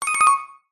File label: cartoon musical riff cheeky electric piano blink flutter